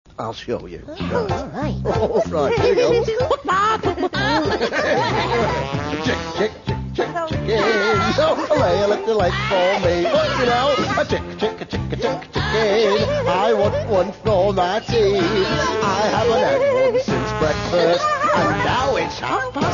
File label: chicken